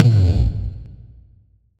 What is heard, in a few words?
Percussive Stab